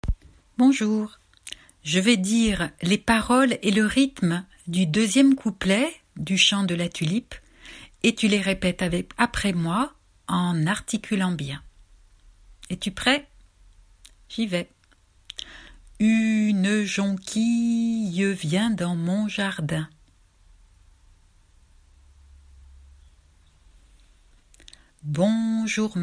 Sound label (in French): la tulipe couplet 2 paroles et rythmes